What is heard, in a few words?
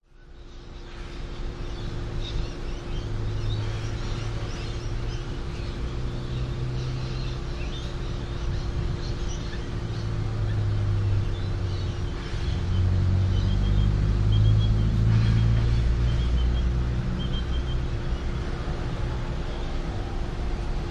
vehicles traffic outside front factory traffic trucks constant whir of extractor